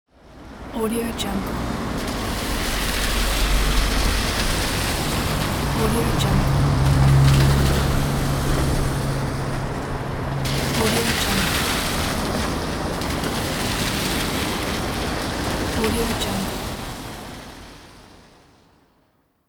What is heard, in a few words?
cars driving on wet road